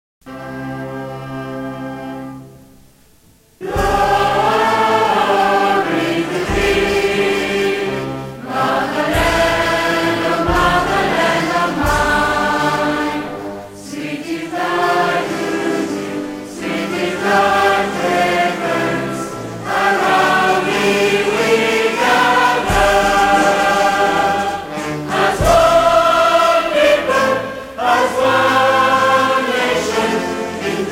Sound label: National Anthems - Choral